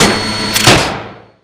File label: drill end; Spiral Cannon